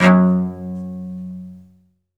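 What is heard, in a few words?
SOLO cello, Strings; Synclavier; ILIO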